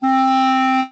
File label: Clarinet tone; LEGO My World First Steps